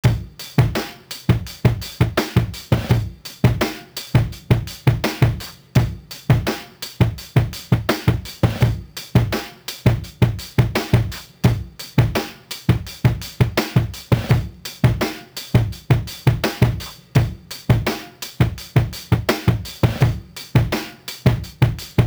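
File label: Boom Bap Drum 84BPM D minor Vintage Dirty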